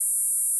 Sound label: Gauss Filt F5